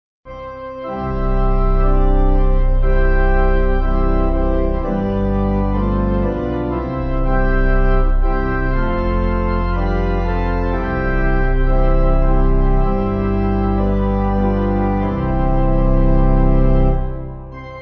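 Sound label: Pipe, Pipe Organ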